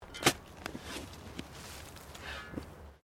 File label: Digging Shovel Sand; Various digging tools and processes; Construction Sound Effects; Construction (Sound Effects:Backgrounds)